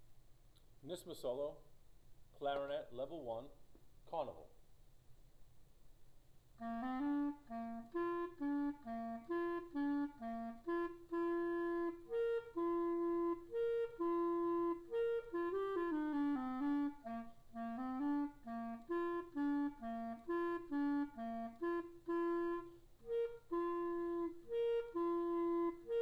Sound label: carnival clarinet solo level 1; Clarinet NYSSMA Solos